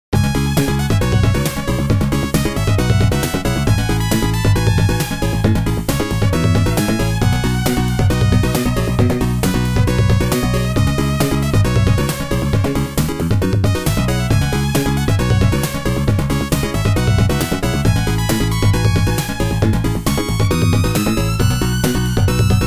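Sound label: FM synth music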